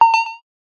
retro game hit arcade 80s simple alert notification classic; epic stock media